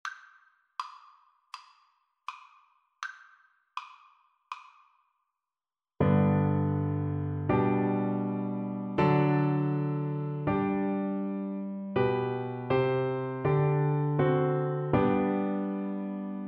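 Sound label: recorder karaoke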